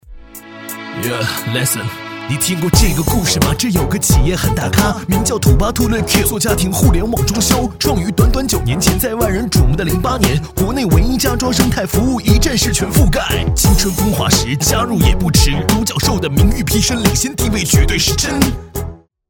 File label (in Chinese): 男国 歌曲翻唱 说唱; 土巴兔